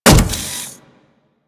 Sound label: Riot Moa Step Default, Footsteps; Warframe